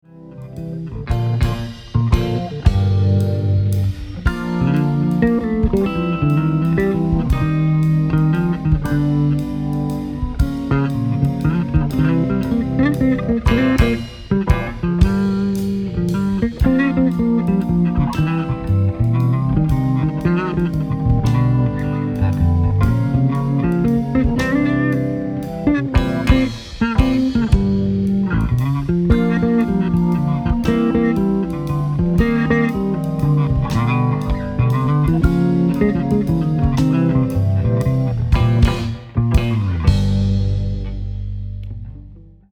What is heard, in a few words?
Bass Solo